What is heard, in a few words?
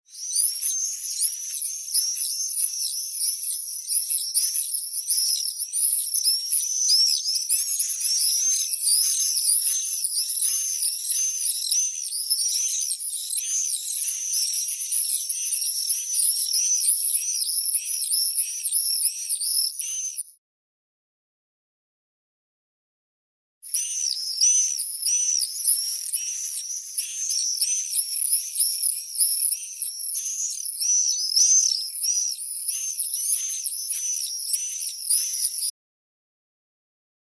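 common swift apus apus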